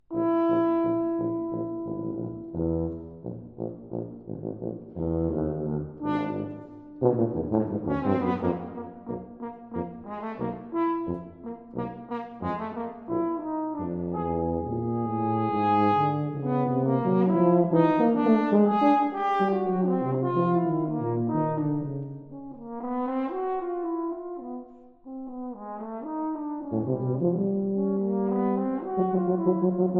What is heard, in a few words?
For Horn And Tuba, March